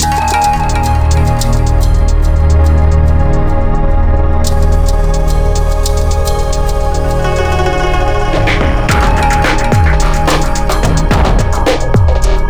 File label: music bar; freelancer-hd-edition